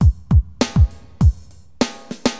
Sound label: DM LOOP, HipHop, Drums